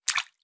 Water Splash; Survival Game Sounds - Lite Edition; Environment

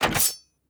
Trap; Survival Game Sounds - Lite Edition; Combat and Hunting

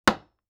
Nail Wood; Survival Game Sounds - Lite Edition; Crafting

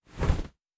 Cloth Handle; Survival Game Sounds - Lite Edition

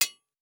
Blacksmithing, Crafting; Survival Game Sounds - Lite Edition

